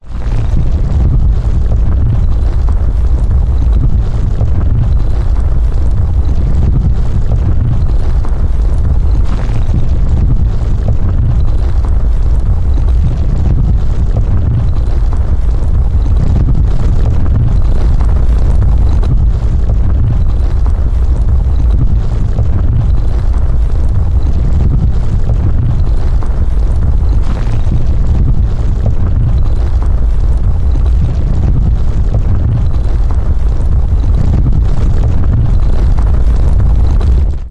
nature rocks landslide boulders heavy low frequency rumble sustained